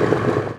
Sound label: cooking Fadeout; Singles Flirt Up Your Life